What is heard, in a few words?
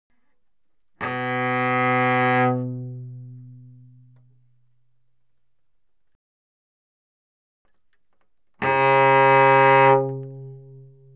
Cello arco ff sul G B2Bb3; Strings